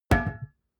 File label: glass door small close